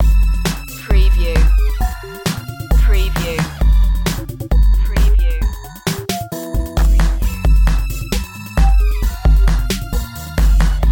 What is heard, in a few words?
saw melt loop